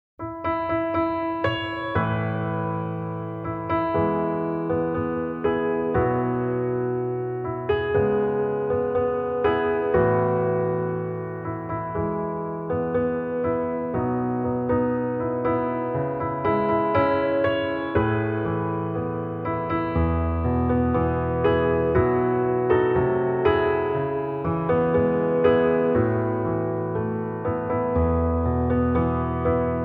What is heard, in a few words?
piano ver.; Tsukihime